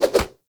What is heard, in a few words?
Bayonet Melee Combo; Warframe; Tenno